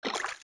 Steps Deep Water Jump; Zelda; OOT

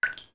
Beneath Well Droplet; zelda; OOT

